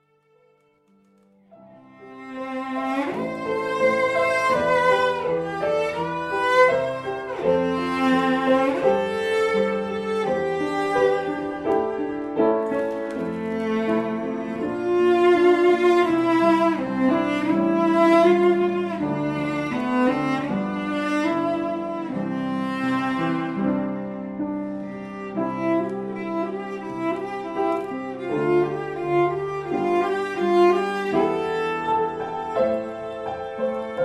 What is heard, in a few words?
Cello Klavier